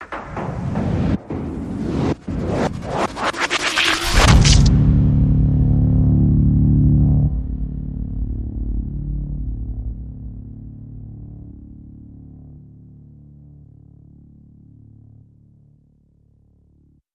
Hit Big Spurt

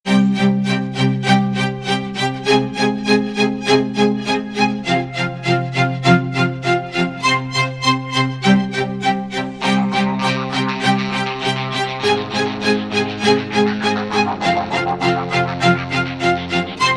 Strings, No Drums

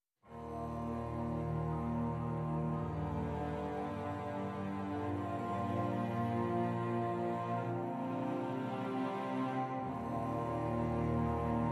Strings Only Mix

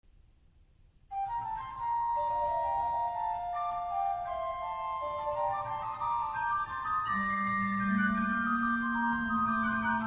a 2 Clav et Pedale; Wir Christenleut; BWV710

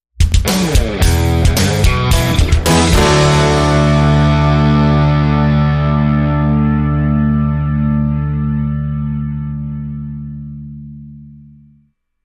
Classic Rock

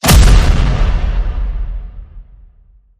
Hit The Brakes

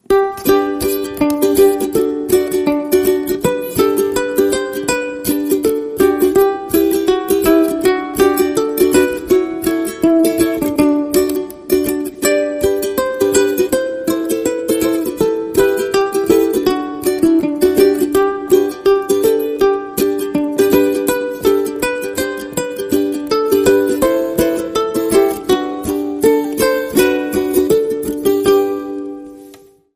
Oh Come All Ye Faithful Ukulele

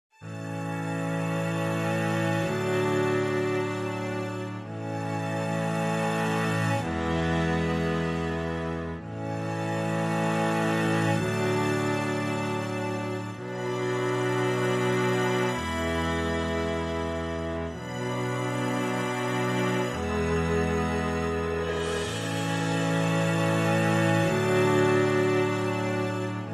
Drums and Strings Only

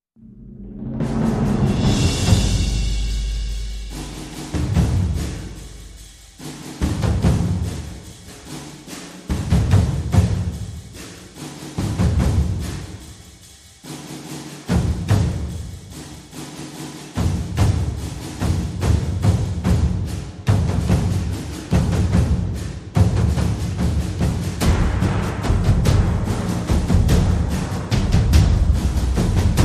Percussion Only

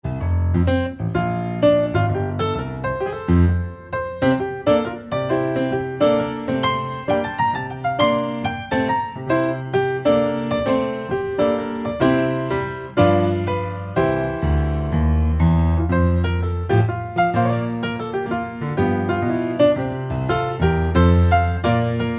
The Twelve Days of Christmas Upbeat; Trad (PD)

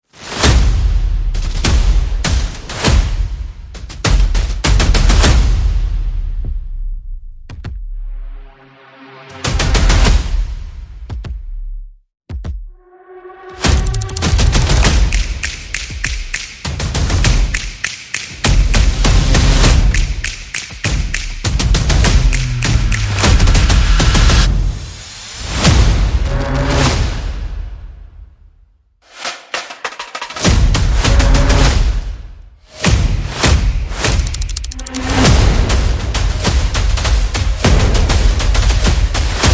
Percussion and Effects

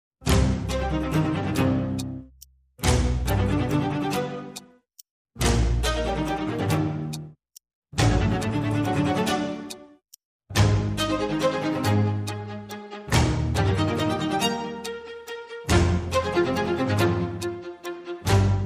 Light Percussion

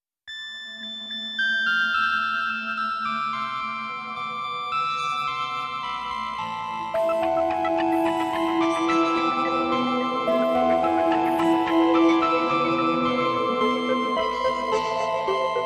A Bleepy Carol Of The Bells, No Drums Mix

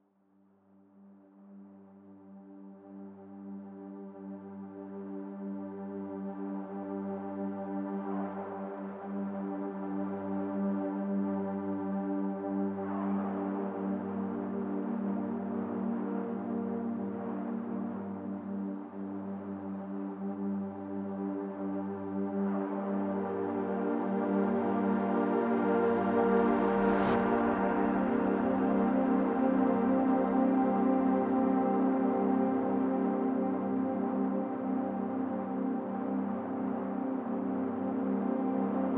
Ambient Mix

